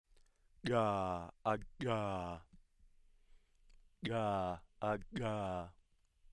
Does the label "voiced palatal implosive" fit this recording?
Yes